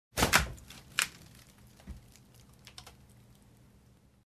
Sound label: Opening Ice Compartment